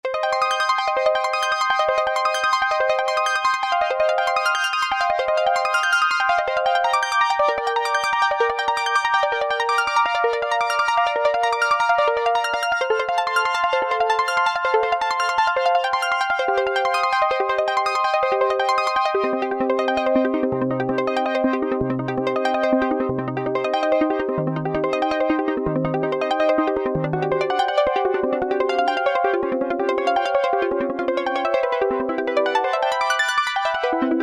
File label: arpeggio, Roland Juno-60